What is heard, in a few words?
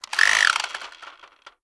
MECHANICS Cog Spin; mono; MACHINES & MECHANICS; Universal Sound FX